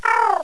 Bandicoot Breathe

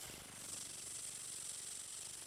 Flap Fast

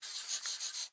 Sketch Env Write; Maze

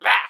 Pecheneg Gray Damage

